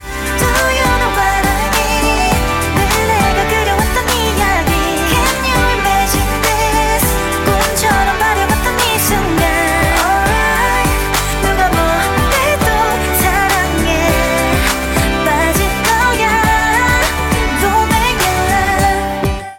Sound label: Dont Make Me Laugh